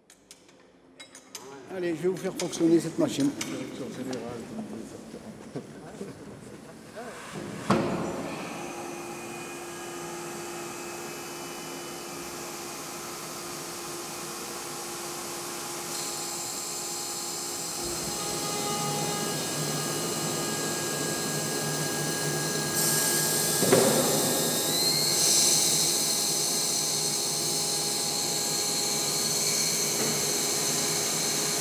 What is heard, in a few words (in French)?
Machine des mines; PAYSAGE SO NORD OIGNIES; du 9-9bis